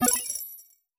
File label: collect gem